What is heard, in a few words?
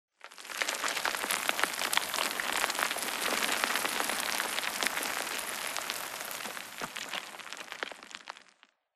Rock Debris Small Long; Boomtown; Titanfall 2